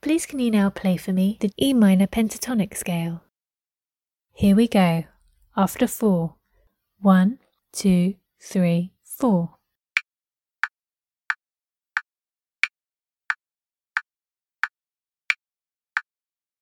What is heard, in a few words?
e minor pent scale